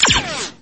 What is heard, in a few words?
fire sound; lego star wars; boba fett